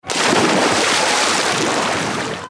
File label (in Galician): tirarse o mar